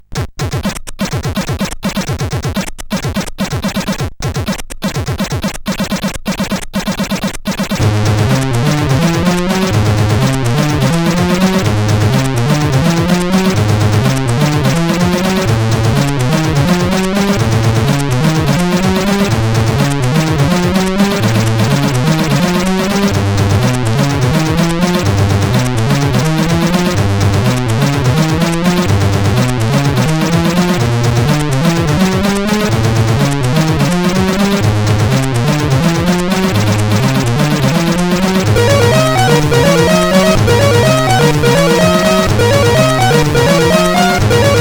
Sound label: Hot Rod, CSG8580R5